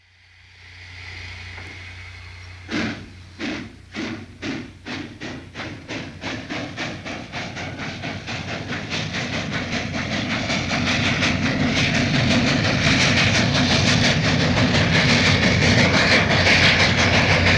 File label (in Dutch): stoomlokomotief vertrek